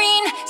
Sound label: vocal